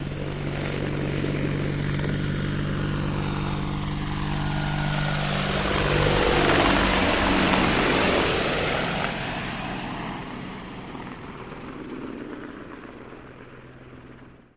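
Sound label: Apache Fast By Right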